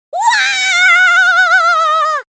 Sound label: fall